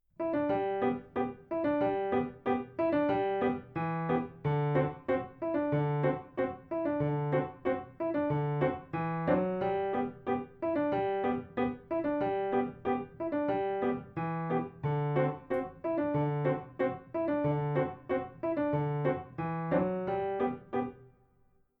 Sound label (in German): Flohwalzer